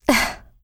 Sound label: laugh; Eternal Return; Katja; ENG